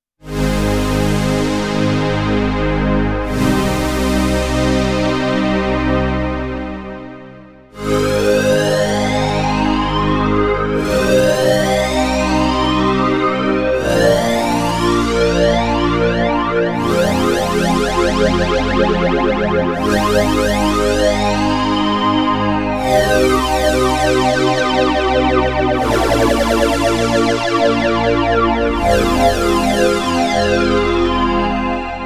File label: Synth Strings; KORG Eternal